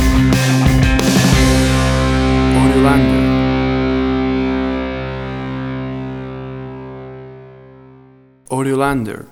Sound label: A Basket Case of Punk Rock Stinger